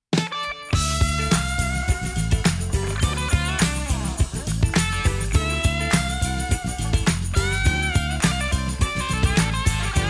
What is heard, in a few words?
Hear That Guitar Ring